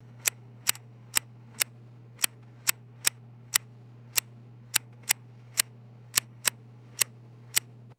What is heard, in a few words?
dry mechanical switch and button clicks